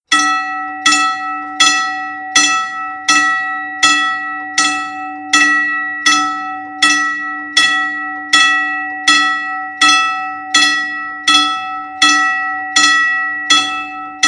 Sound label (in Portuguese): Sino de Locomotiva